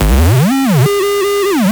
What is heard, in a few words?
Fill 140 BPM